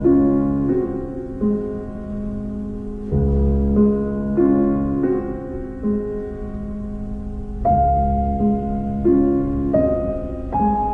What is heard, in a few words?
piano, Berceuse Lullaby in D-flat; Opus 57